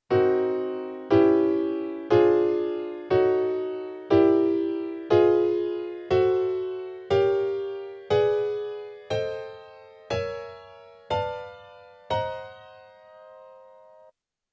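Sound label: chord m7b5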